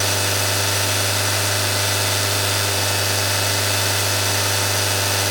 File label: Engine Kart Rpm Med; kart racing; Boom Karts; Android